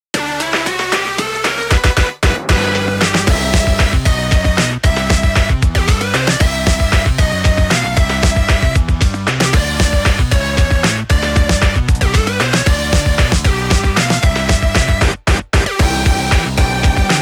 Underwater; paper mario the thousand year door; Super Bowser Bros.

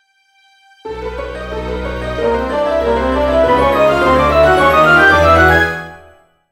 Open Treasure Box; hd; the legend of zelda the wind waker

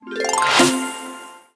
Score Full

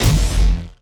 Gauss Cannon Electric Arc; Robobeat

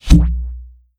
Fire Dodge Ball

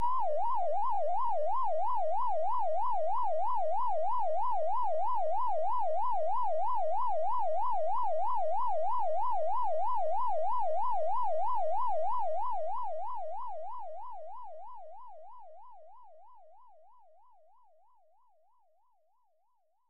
In-Game Ambience; pac man 2 the new adventures 1994 snes

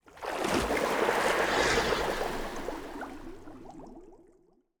Field Mat Tap; Yu-Gi-Oh! Master Duel

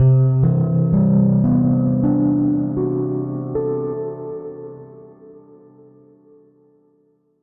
Stinger Discovery; pan pan a tiny big adventure

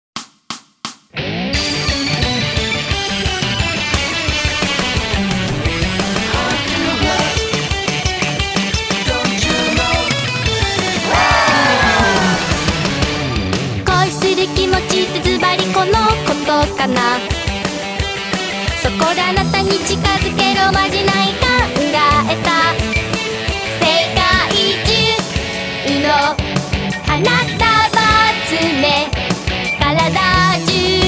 Bass Minus Track

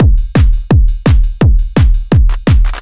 Music Concert Intro; BatBaron

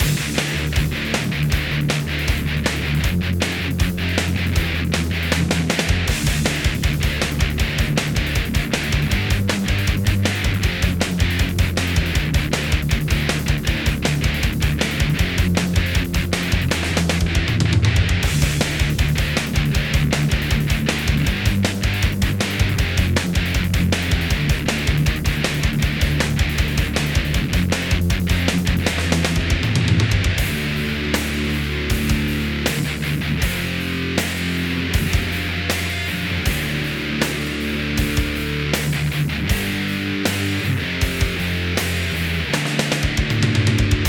BossFight Music; Fighter

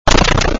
Brick smash; Super Hermanos Mauricio

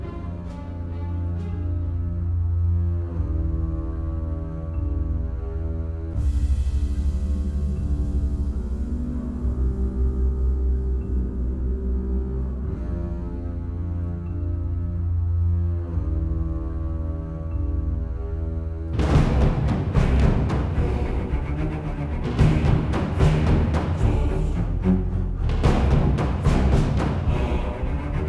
Loop Base; Planetary Annihilation

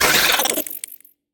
alerted, Insects

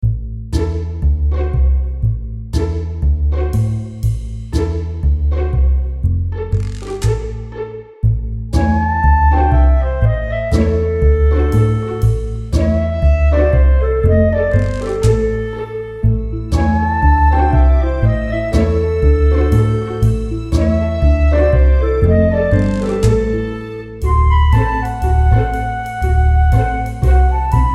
Music Indoor; Jack Keane

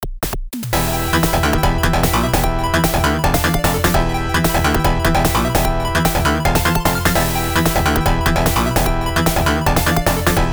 Fight Continued (Game Over); Variable Geo 2; PC-9801; OPNA

